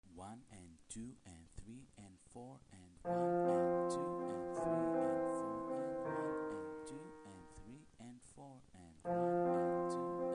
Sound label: eigth notes clapping